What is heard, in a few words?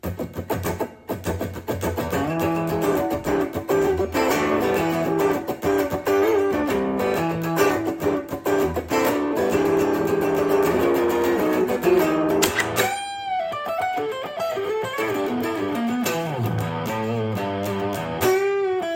Guitar Rock